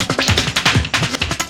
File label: Break, Drum N Bass Percussion